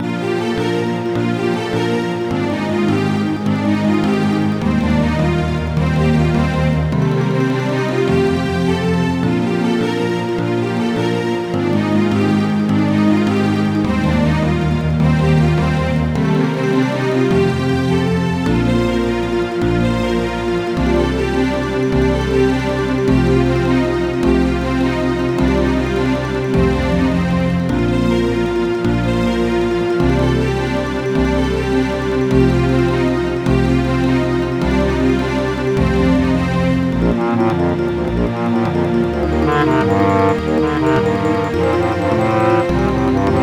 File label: Opening music